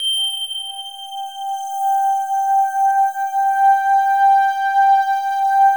World of Synthesizers, SYNBELL

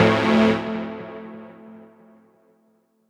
Am Steel saw, Chords; Electronisounds

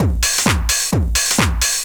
straight, Techno Trance Essentials drum loops

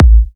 Kicks A; markus hakala

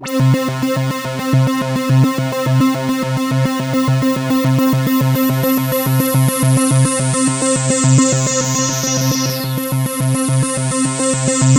C4, SynthLine

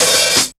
G OPEN, HATS; Zero-G; Funky Elements

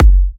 F# kicks; markus hakala kicks

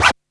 SCRATCH, VINYL FX; Back In Time Records; Big Bang

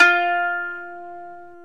ETH KANUN, Eth Plucks, Saz & Kanun; Club-50 - Foundations Roland

